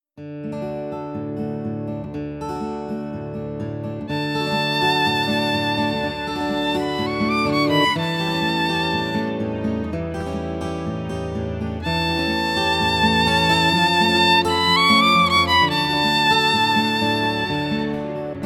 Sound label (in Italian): violino